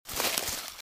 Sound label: Footsteps - Bush walk - Left; Eden; RadwinIsland